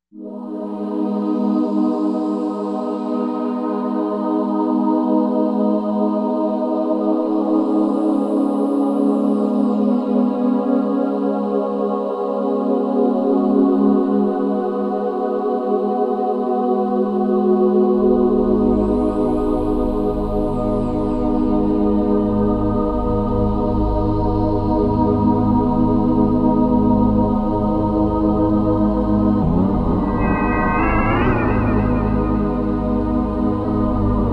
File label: Avant Choir